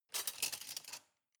cutlery large multiple drawer grab soft

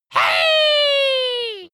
dwarf cheer "hey"

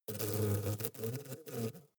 hornet hum hard aggressive

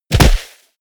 body fall snow powder

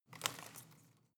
home plant leaves rustle short

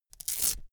velcro open cap quick

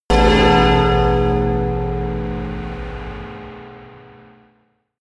melodic church bell tamtam low

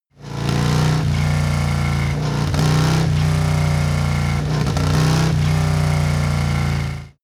motorcycle harley engine rev triple

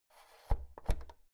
oven knob press out soft

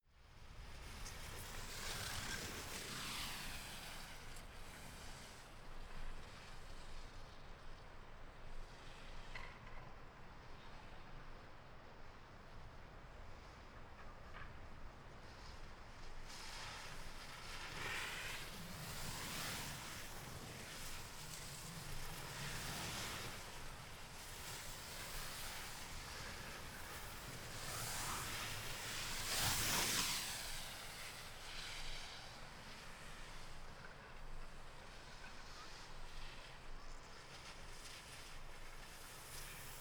ski resort on piste

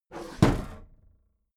desk drawer close heavy

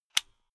unplug safety plug

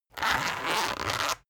zipper run long slow

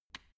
switch turn off soft

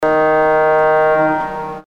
pipe organ fx

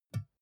glass door small open tap

